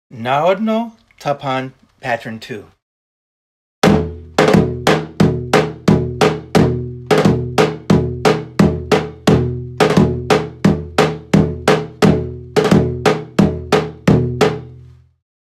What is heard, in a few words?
tapan; Narodno